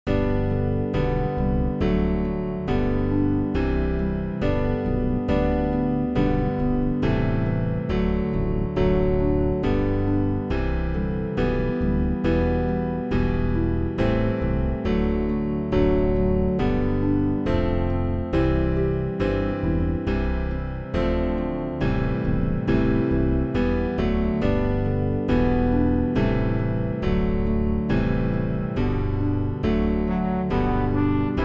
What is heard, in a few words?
May God Be Gracious backing; New Hope Music